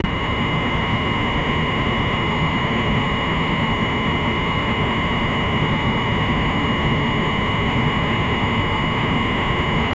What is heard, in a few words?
machine wheel sound random